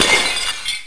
glass; gearbox; debris

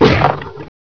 gator bite attack; gearbox

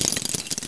MG belt insert, weapons; dod

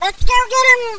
wombat launch, monster; wizardwars

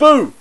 boo; tfc

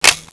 Tommy clip in, weapons; dod

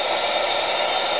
gas leak; innercity3

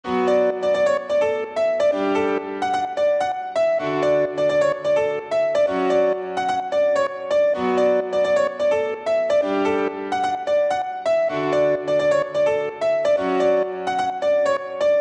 music secret; svencoop; justzombies

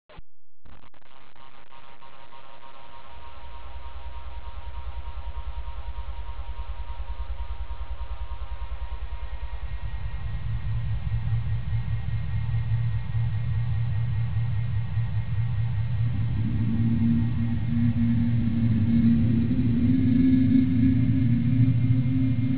ambience; notld

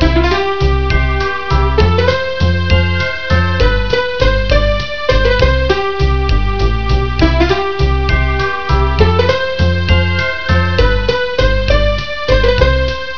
bells; tfc; avbells

